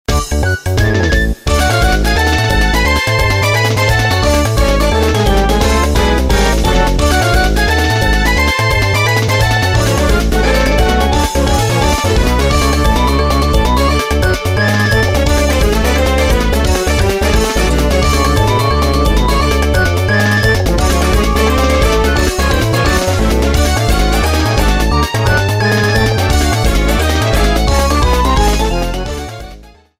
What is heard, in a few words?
"Upbeat" theme; MP6